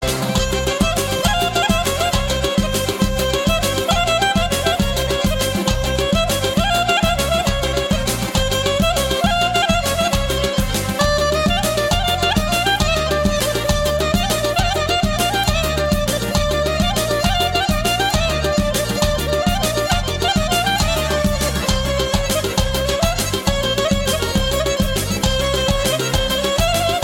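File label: armenian clarinet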